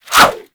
Sword And Whip Whoosh; Warframe

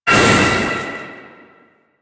Void Release Blunt, Weapons; Duviri; Incarnon Adapters

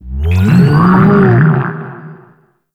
UI Open; Shroom Prince; Warframe; Nokko Colony; Vendors

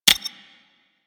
Console Hack Button Press; Warframe

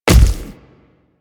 Splitter Step Default, Footsteps; Warframe; Infested; Grey Strain

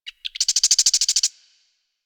Forest Bird Squak; Warframe; Ambience; Grineer Forest

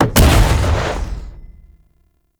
Siege Engine Cannon Fire; Weapons; Enemy; Artillery; Ode Siege Engine

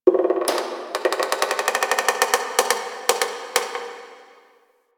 Metal Creak Small; Warframe; Quests; TheNewWar; Ambience; Zariman

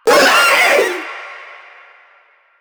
Vocal Short Attack; Warframe; Proto Infested; Runner

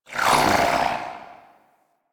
Queens Chamber Shield Activate; Ambience; Warframe; The War Within

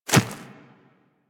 Infested Small Walk Dirt, Footsteps; Warframe

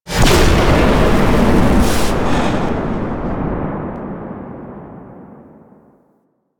Void Dragon Firebreath Cast; Enemies; Abilities; Warframe; Duviri

